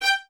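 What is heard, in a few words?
Violins G 5 staccato right; Miroslav Vitous; String Ensembles; 11 Violins; 11 VS Stacc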